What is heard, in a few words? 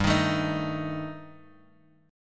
G Suspended 2nd Sharp 5th G down Guitar Open F, Gsus2#5 chord; strummed; JGuitar